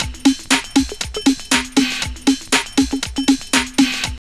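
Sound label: drum loop; X-Static Goldmine 3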